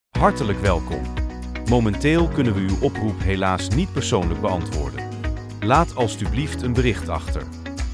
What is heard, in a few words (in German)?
musik beispiel